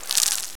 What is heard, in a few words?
Crowd; PGA European Tour